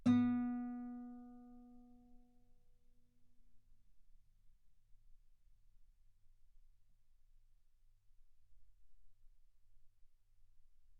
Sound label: Guitar B3; ff; sul B